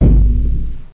zoom click; The Lord of the Rings The Two Towers CD Cardz Rohirrim

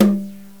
brush tom 4; Drums; XG; GMPlay; Brush Tom 6

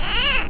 Carrion Crawler Death; Mythos

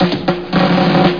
drum roll; SCI-FI Sensation v2.2; apc mission

